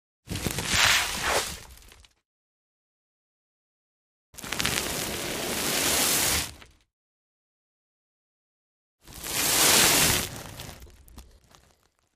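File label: Sand Sack Drag Movement, sandbags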